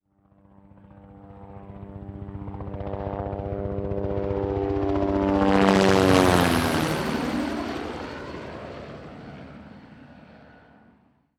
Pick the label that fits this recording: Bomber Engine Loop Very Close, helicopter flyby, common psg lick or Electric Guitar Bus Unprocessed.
helicopter flyby